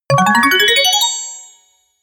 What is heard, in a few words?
notification call